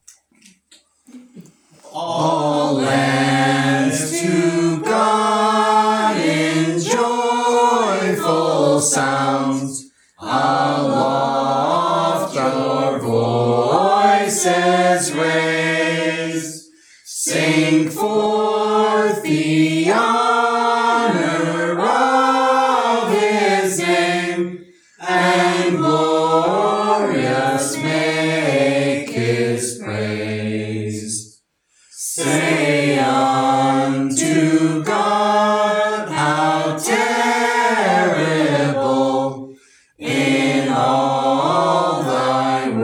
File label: Sung, psalms of david in metre; 1650; scottish psalter; psalm 66